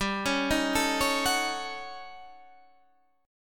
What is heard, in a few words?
Eb 7th Sharp 9th Flat 5th, G